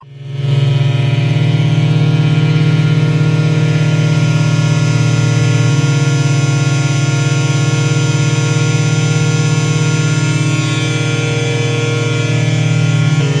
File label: Electronic Recordings From Maui Jungle; Editions Mego